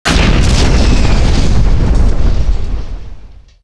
explode; gamediameter

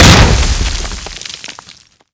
camp die; boswars

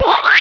splash; pingus

